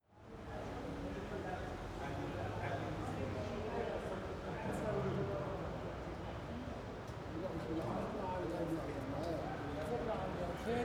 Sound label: taxi; central-continent; city